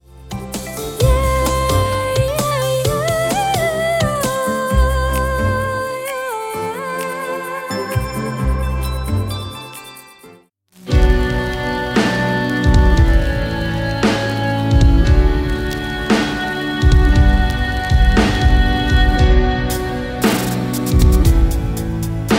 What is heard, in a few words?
Backing Track